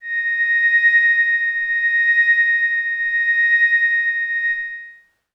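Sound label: Glass Howl Kawaii D sharp 6; pads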